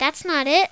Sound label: bummer